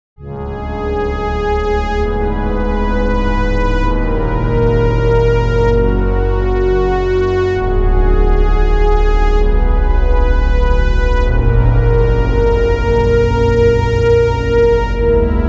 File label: musical sting